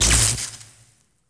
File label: cable zap; electrical sparks